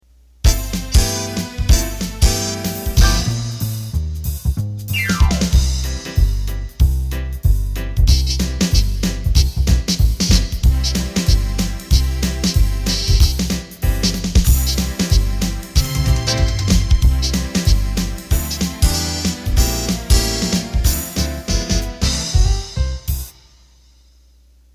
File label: Quick Step, accordion